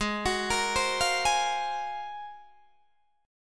Ab 13th down Guitar Open D

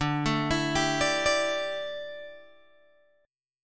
D Suspended 2nd Suspended 4th, Guitar, Open G

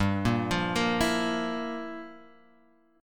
G Minor 13th G; Gm13 Chord {3 1 3 3 X 0} arpeggiated

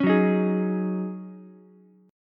Eb Minor 11th Eb down Ukulele C6; Ebm11 strummed; JGuitar

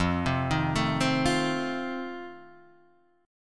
F 7th F down; F7 arpeggiated

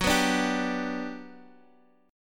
G Major F sharp, chord

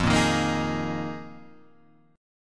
Bb 7th Suspended 4th F

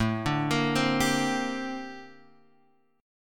Bb Major 13th A

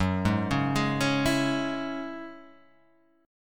F sharp 7th Suspended 2nd F sharp down

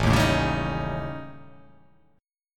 A 7th Flat 5th

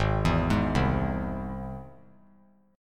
Ab Augmented Ab; Ab+ arpeggiated; JGuitar

